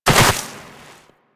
Land Soft Snow, Footsteps; Warframe; Operator

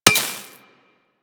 Laser Impact Metal; Surface

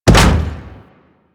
Arachnoid Camper Step Default; Warframe; Corpus

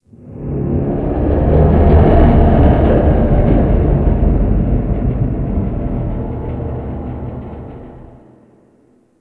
Ocean Hull Creak; Ambience; Warframe; Grineer Ocean

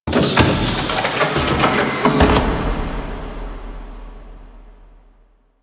Operator Pod Open; Warframe; Liset; Hub; Lower Deck

